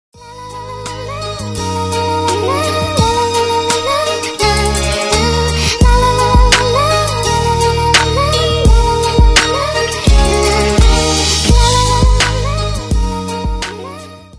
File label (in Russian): na sms soobschenie